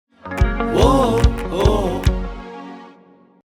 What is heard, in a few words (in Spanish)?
AUDIOLOGO; RAUL CARDONA